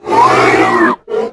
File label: mutant pain